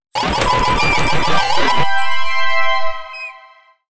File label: Jingle; Osteotic Bypass